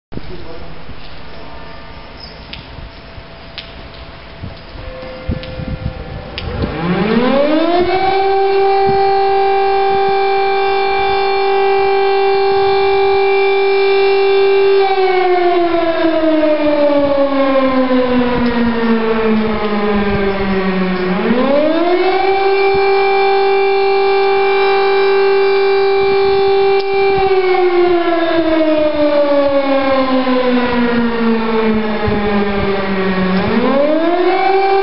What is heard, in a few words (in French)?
sirene alerte